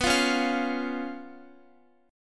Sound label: B Suspended 2nd Flat 5th, Guitar; strummed; 4F,4A,5C,5G,5C,6E; x,x,11,10,13,13